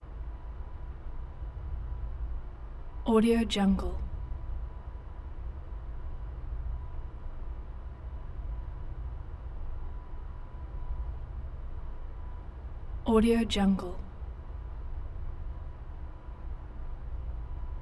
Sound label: humans breathing contact microphone; human sounds